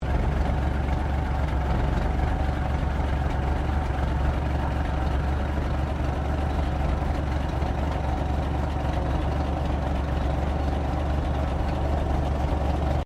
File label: CAR HOLDEN FJ IDLE GENERAL LOOP, Classic Australian cars; Holden FJ 1955; Cars (Sound Effects:Vehicles)